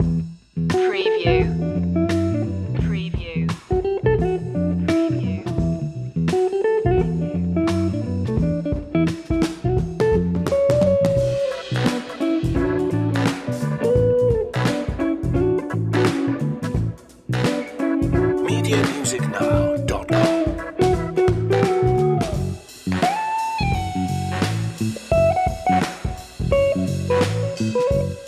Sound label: cashmere deluxe loop